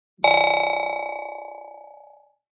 Clickie; Clicks; Multimedia

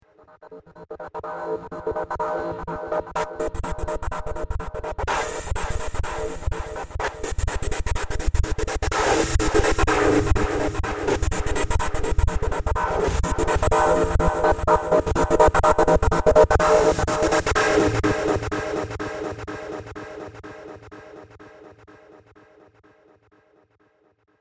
Steampunk Movie Trailer Transition; Andrew Fleming

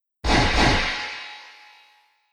Metal Explosive Impact; Explosive Impact 05; Miscellaneous Impact Sound Effects; Andrew Fleming

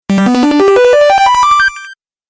Game Positive Win Award, video game

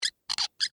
Metal Hinge Squeak, Foley, Metal, Hinge, Squeak; Latches (Sound Effects:Objects)

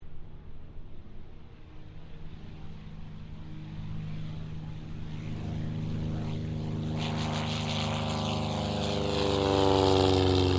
AIRCRAFT CT4A TAKEOFF; CT4 military trainer aircraft; Airplanes; Vehicles

